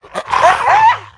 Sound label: Swift Hyena Death